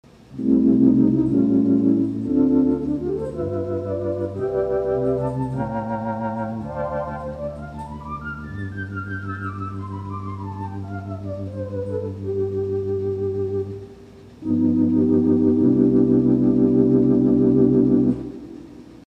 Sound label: Flute